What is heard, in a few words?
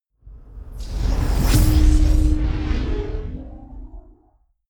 magic spell whoosh gong; swooshes-swishes